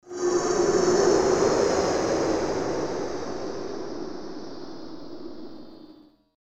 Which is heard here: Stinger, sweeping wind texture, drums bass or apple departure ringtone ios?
sweeping wind texture